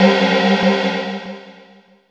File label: T DP SGONG FX; Back In Time Records; Korg Universe VOL-1; DRUM FAMILY